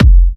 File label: Electro Kicks; Vengeance - Essential Clubsounds Vol.4; Bassdrums